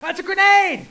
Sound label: grenade; cstrike